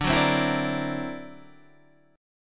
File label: D Augmented 9th, guitar